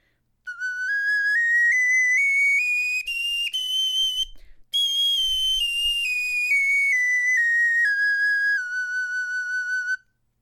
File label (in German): tonleiter, knochenfloeten